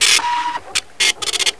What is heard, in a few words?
printer dotmatrix